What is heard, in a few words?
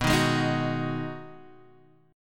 B 6th B down Guitar Half Step Down